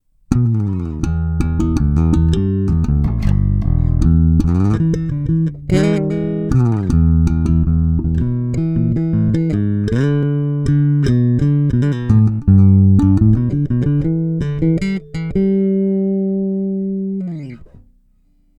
Both passive

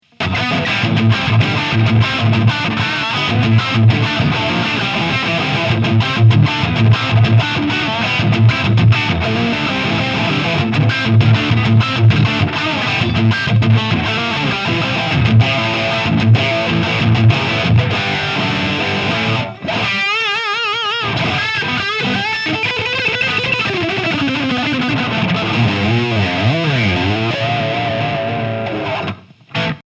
Friedman DS Red Channel

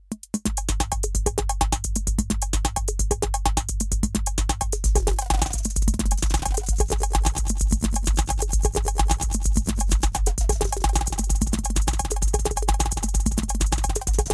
Erica Synths Black Hole DSP2 Tip Tap Delay

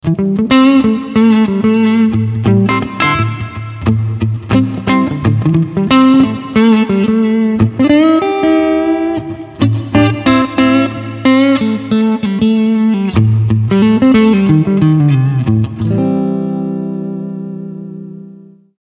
Clean Picking Riff

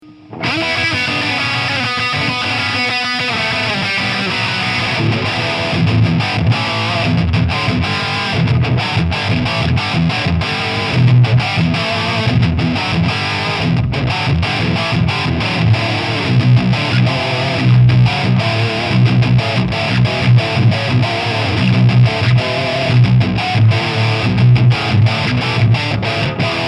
Baritone High Gain Bridge Pickup, guitar; Chapman Guitars ML3A

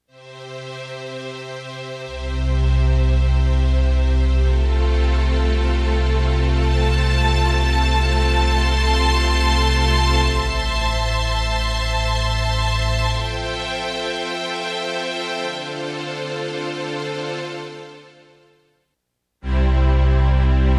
Strings

